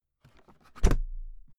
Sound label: briefcase close hard; industrie wirtschaft; bueroarbeit